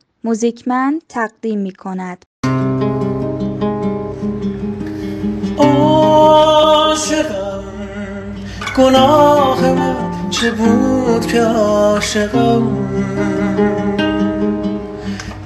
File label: havaye del ba gitar